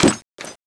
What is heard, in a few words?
bayonet knife, weapons; sterling